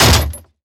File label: Impact Gate Wooden Gate Hit With Chain Thud; Impacts